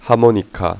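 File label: harmonica, band; My Animated Word Book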